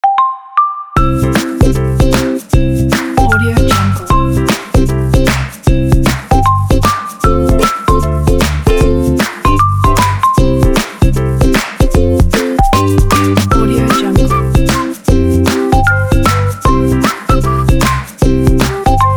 cool day

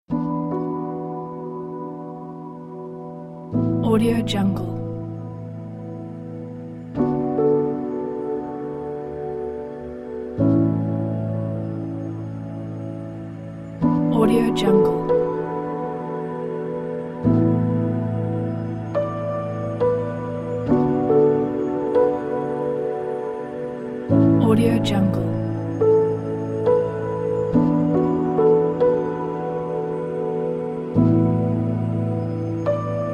ambient piano pack

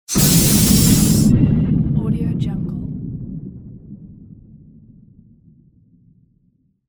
shot steam

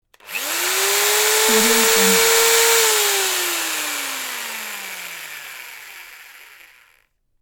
drill

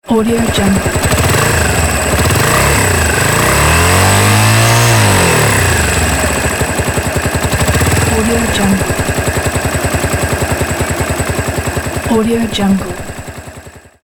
motorcycle motor

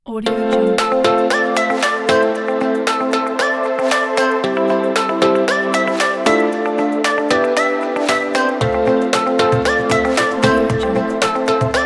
summer pop music

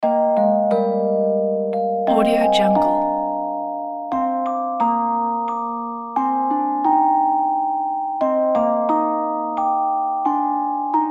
the first noel

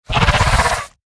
short rumbling dinosaur roar

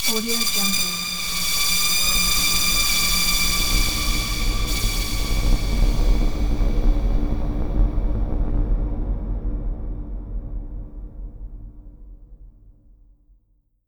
dark scifi transition

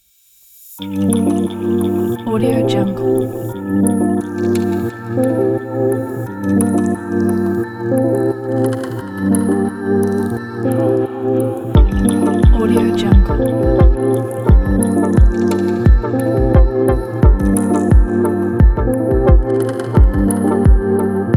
chill beat

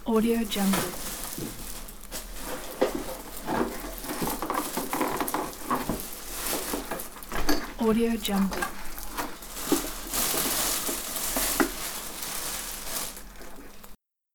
new box open

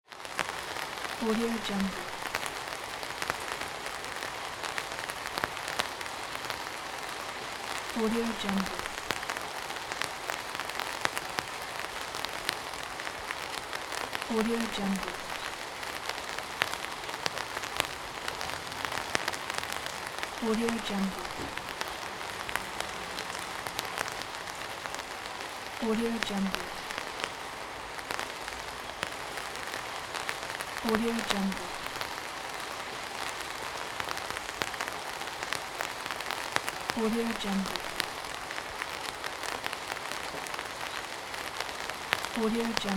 rain under an umbrella

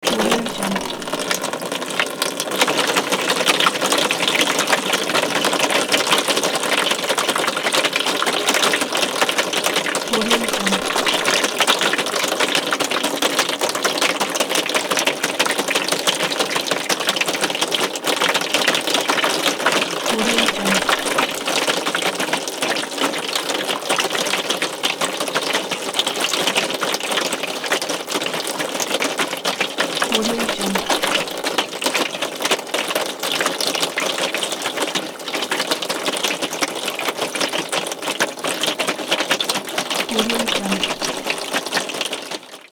garden hose spray on glass

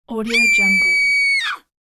woman high pitched scream

